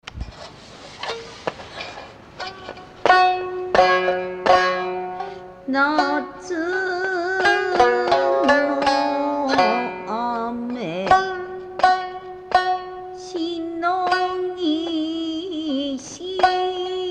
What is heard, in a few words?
Shamisen